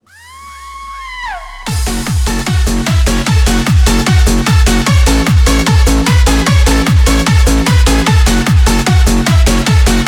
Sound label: Fat Bass